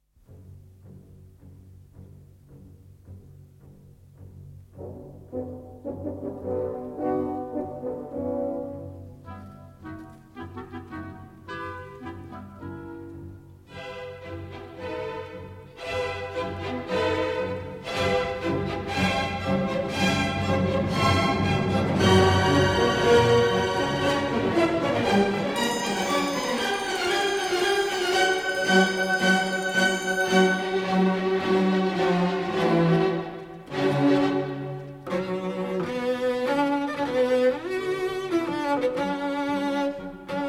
Cello Concerto in B Minor, Finale. Allegro Moderato; Dvorak